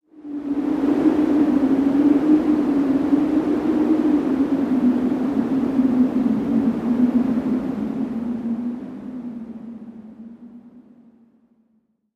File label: wind howl, ambiences